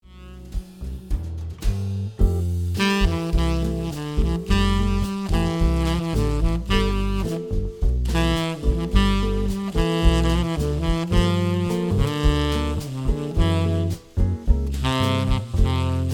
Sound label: solo acc